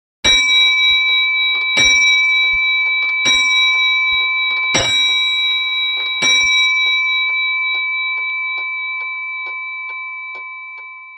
Clock Chime; Clock Strikes Two, Three, Four And Five.; Thomas Mudge Clock